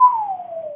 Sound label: lose duel